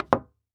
Foley Footstep High Heels Single Step Up On Hollow Wood Surface; Foley Footsteps